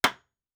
Nail; Fantasy Sounds Bundle; Craft

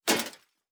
Armour UI; Fantasy Sounds Bundle

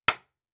Nail; Fantasy Sounds Bundle; Craft

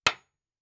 Nail; Fantasy Sounds Bundle; Craft

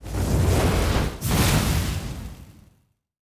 Fire Spell, Magic Sounds; Fantasy Sounds Bundle

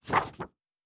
Book Page; Fantasy Sounds Bundle

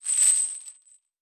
Chain; Fantasy Sounds Bundle; Craft

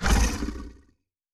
Large Creature Short, Monsters; Fantasy Sounds Bundle; Large Creature 13

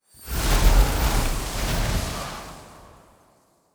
Ice Spell, Magic Sounds; Fantasy Sounds Bundle

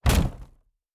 Shield Wood

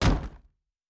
Shield Wood; Fantasy Sounds Bundle; Medieval Combat Sounds

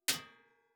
Metal Hit; Fantasy Sounds Bundle; Medieval Combat Sounds

